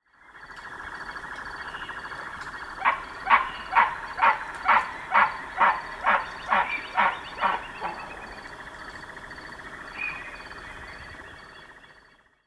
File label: Goliath-heron, پرنده غول حواصیل